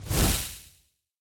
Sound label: Fire dash Short